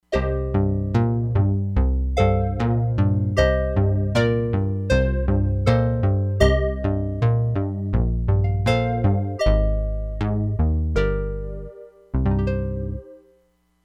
PHASE GUITAR HOLLOW, Crumar Bit 99; Factory Programs

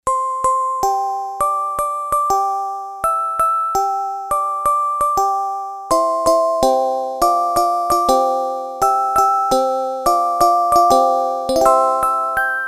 Sy.Perc., Yamaha DX11; Factory Preset Voices

